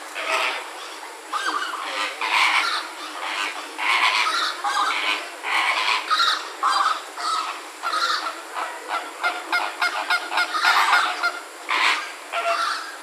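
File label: Phoenicopterus roseus adult; Greater flamingo; Adult birds in a flock constantly have a chat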